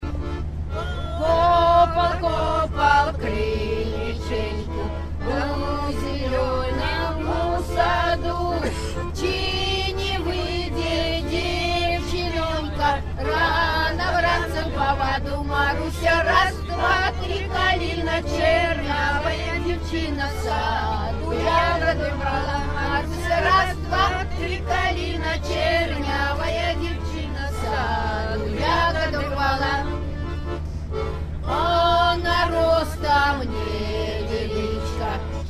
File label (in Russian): маруся песня